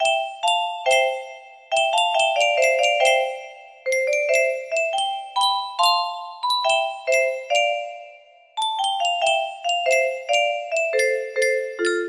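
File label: with chord, music box melody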